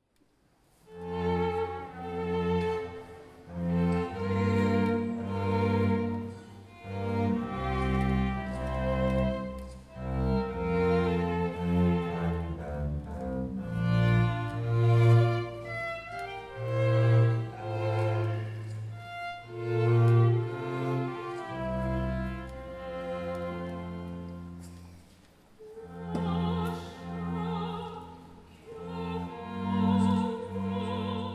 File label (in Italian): con soprano; lascia quio pianga